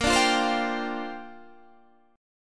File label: Bb 6th Add 9th Bb Guitar Standard; Bb6add9 strummed